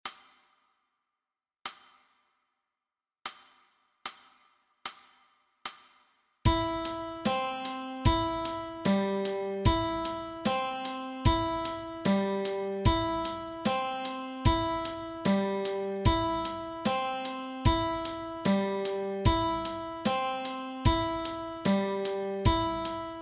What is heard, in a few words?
Guitar Exercise